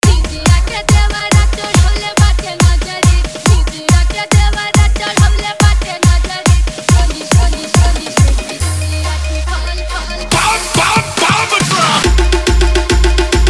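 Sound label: Edm Bass Remix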